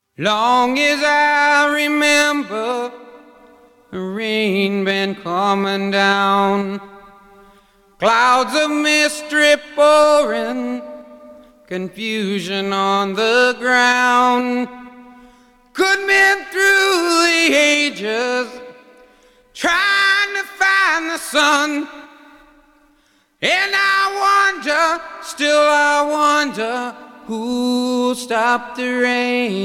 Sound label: vocals